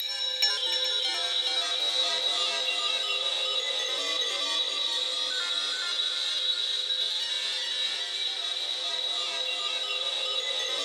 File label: EL.CHIME 3, Yamaha VP-1, ELECTROCHIME; Music Store; 90's Sample CDs